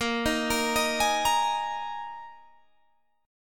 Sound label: Ab Suspended 2nd Bb